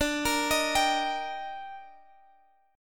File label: Eb Major 7th D